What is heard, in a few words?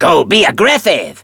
attack